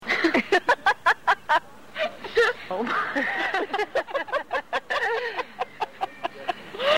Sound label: LAUGH